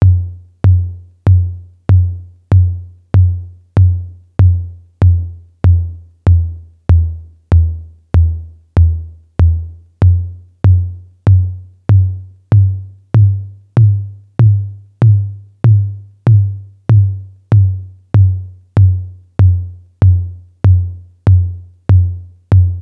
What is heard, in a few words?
TR-808 Low Tom; Roland; Instrument samples